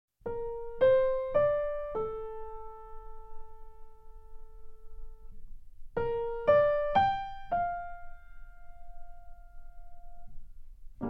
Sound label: Piano Sea; Layton